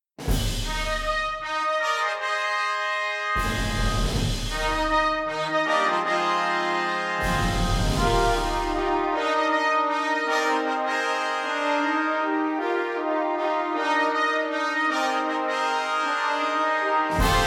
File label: triumphant fanfare